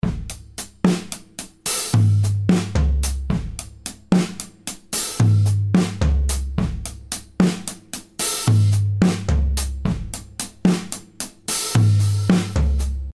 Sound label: drum loop 220 bpm 6 8